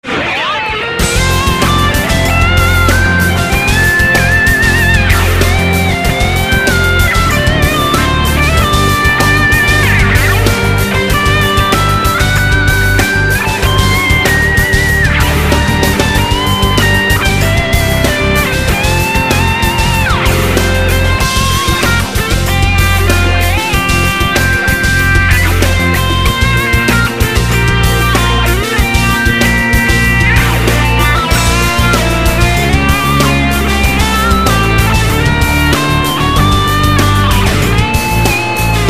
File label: RingTone